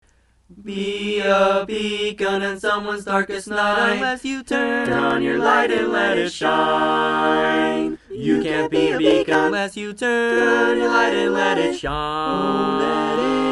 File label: Beacon Bass, barbershop tag